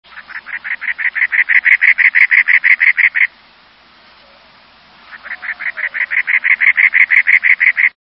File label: Cactus Wren